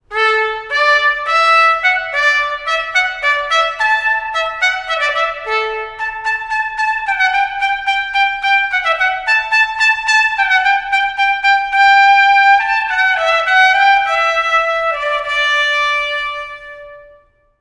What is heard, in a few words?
Hornpipe Solo